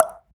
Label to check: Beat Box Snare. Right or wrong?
Right